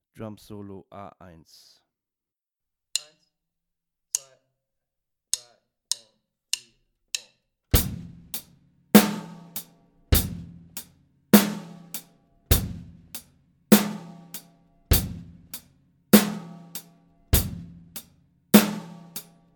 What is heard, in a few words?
drums solo